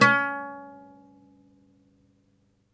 OUD C#3, Plucked Strings; E-MU Producer Series Vol. 7 – Old World Instruments

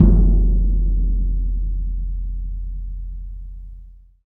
PRC CONCER, Surdos; Roland - Rhythm Section; Latin 3

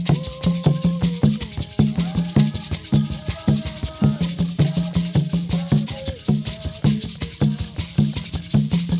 REJOICING; Spectrasonics; Heart of Africa 2

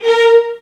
VIOLINS, Synclavier Strings; ILIO

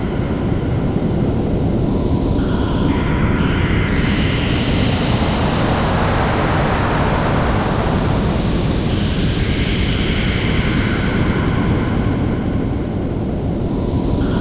stereo wind; Zero-G Ambient 1; STEREO WINDS

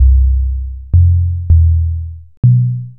Dumb Bass; Sonic Foundry (Sony Creative Software); Knutrix Drum & Bass

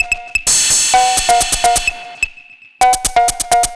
Perc 128; musicradar

